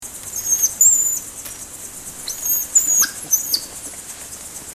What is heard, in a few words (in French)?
coati cri, cris d animaux